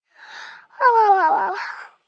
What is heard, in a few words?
Yawn Toddler, 1 young child, yawn, cute; Gasp, Breath; human voice, kid; Gasping; VoiceBox